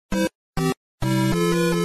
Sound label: game over; super mario